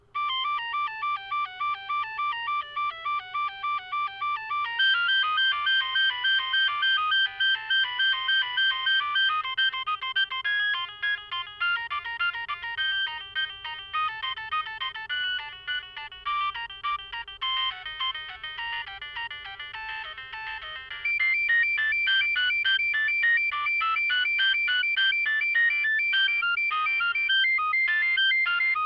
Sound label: Fuga